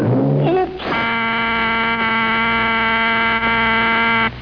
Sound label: BUZZER